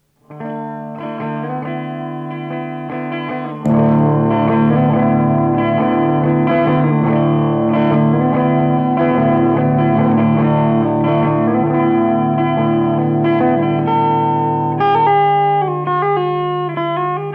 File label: les paul clean